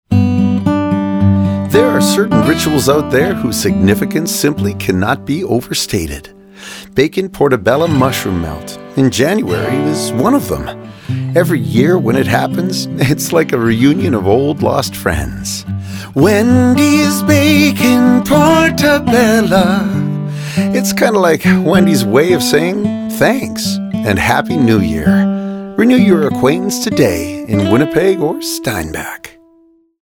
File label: Acoustic